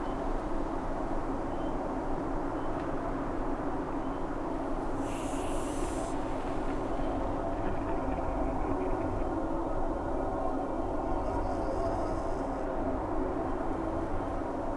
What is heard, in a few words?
Ambient